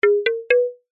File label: cartoon footsteps short sneaky wooden mallets; zapsplat